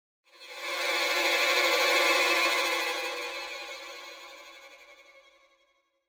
Symphonic Hit Strings Tremolo - Horror; Hollywood Edge; sound designer's toolkit v2

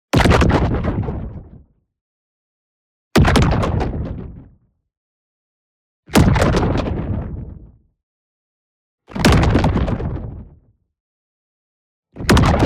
EXPLOSION LARGE Distorted Slow Implosion; boom library; Matter

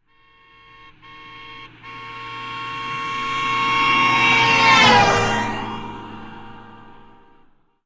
car; Blind Drive

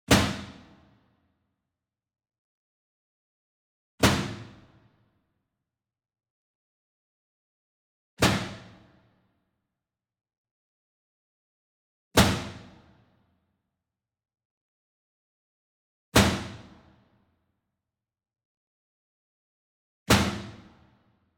Sweetener Metal Bin Hard Hit Medium; boom library; Cinematic Strikes Construction Kit

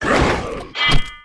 Stinking Knight Heavy Attack; Blind Quest - The Frost Demon

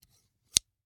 Scissors, snap, snip, shear, large, single; Eiravaein Works; Vaeyan IV

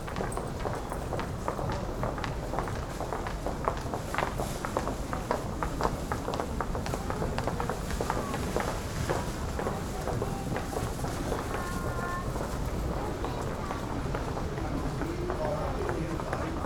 Urban people footsteps babble; urban and suburban; boom library

